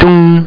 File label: auto